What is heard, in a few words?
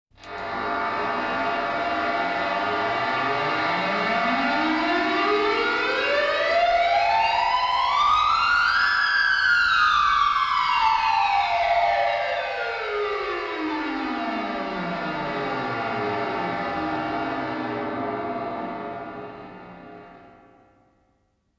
martenot gliss